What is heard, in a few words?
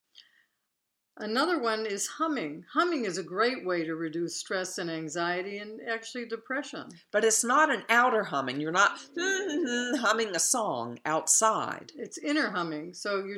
Inner Humming Exercise; Seven Abundance Hacks